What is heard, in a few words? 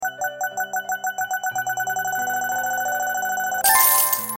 draw music